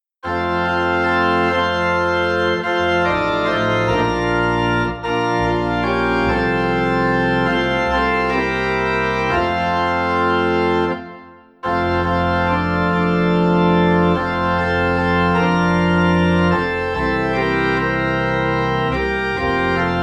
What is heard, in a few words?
Organ in F